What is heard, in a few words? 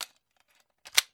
smg clip in